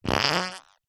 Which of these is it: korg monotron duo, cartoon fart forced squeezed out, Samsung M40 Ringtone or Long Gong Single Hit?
cartoon fart forced squeezed out